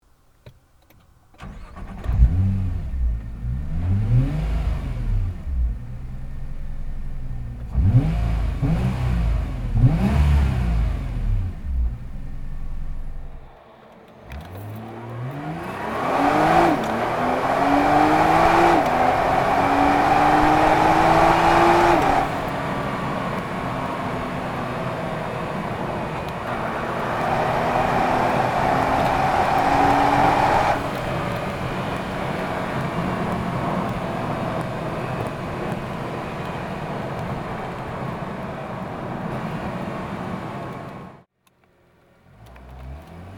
Nissan GTR

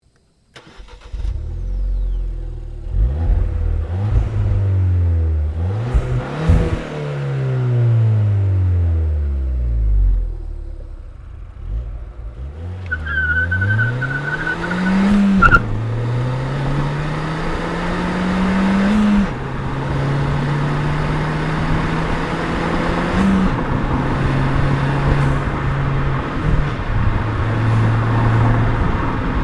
Ford Fiesta ST

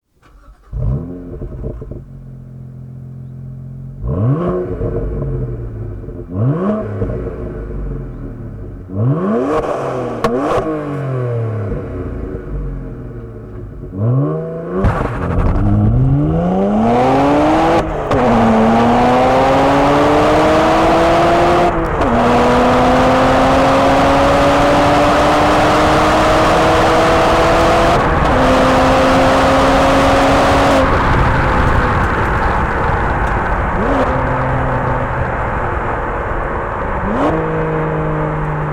BMW 1 series M Coupe

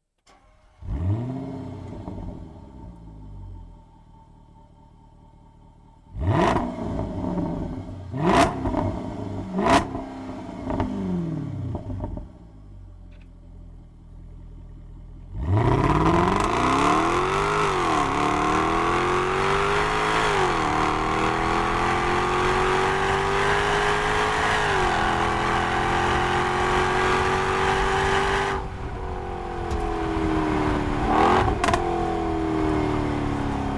Jaguar XKR S

